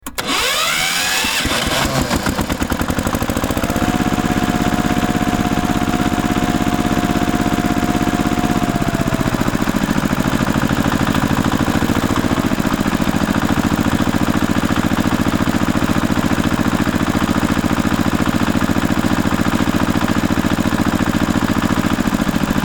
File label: tractor sound ambient farm sounds